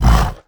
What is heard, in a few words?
golem stone hit; Crypt of the NecroDancer